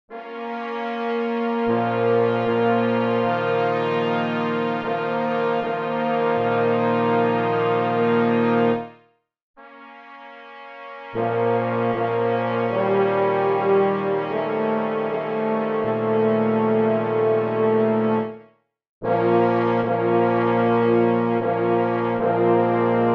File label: Rain